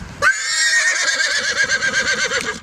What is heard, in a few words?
excited horse noises